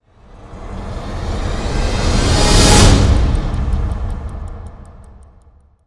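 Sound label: sting, drum hit breath swell; transformers war for cybertron gamerip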